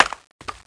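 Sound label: wood chop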